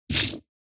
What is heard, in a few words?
Cut